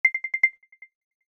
broadcast; TTCom Soundpacks; Apple

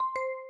Round With Spin; Business skieme Beta 1

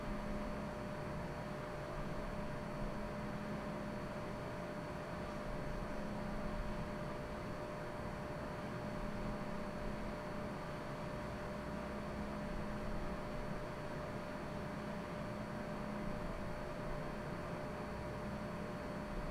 room; Original TK Sounds

